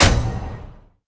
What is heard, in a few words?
auto hit; smite; Apollo